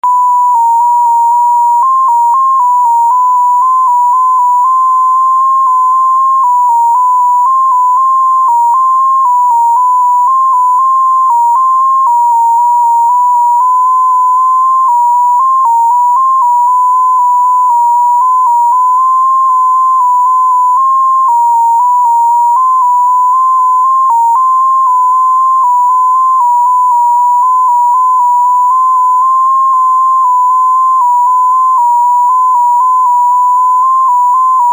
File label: domino 4